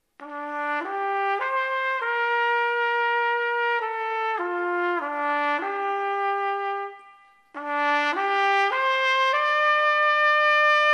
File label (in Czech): fanfara